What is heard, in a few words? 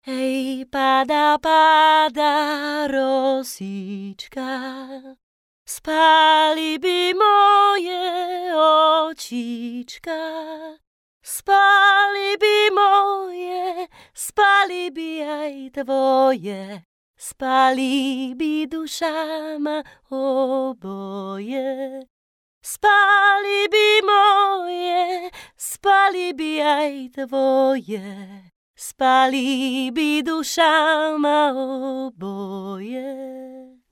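Female Czech sing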